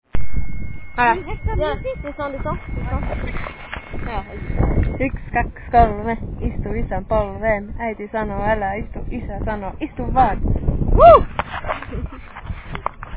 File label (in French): chanson; Naantali